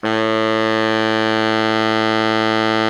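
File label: baritone mezzo forte A 1, Sax; Giga Samples Collection; BARITONE MF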